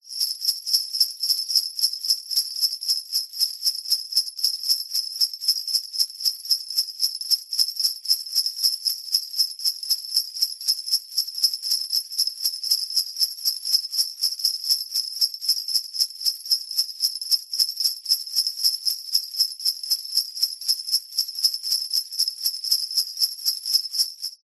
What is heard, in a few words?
musical sleigh bells med shake